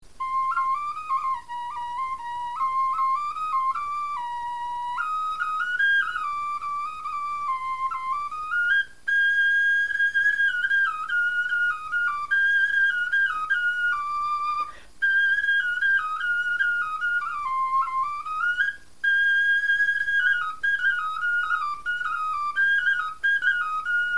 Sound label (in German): melodie, knochenfloeten